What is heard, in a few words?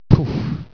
PUFF; LOTUS